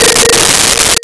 Vibes Bb4; Nintendo; July 2020 Gigaleak

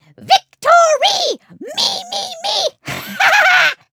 grunt; alphamoon

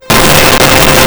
Warm Pad C5; Nintendo; July 2020 Gigaleak

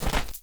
dirt land, footsteps; chief

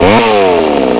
engboost; Nintendo; July 2020 Gigaleak

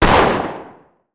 battle fire, battle rifle

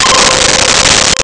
Shakuhachi C5; Nintendo; July 2020 Gigaleak

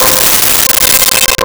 Koto C5; Nintendo; July 2020 Gigaleak

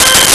Rock Organ C6; Nintendo; July 2020 Gigaleak

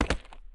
jump, footsteps hard surface

